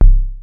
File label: Bass Drum, Cwejman S1 MK2, Drum Machines; 300 Drum Machines